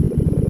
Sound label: grind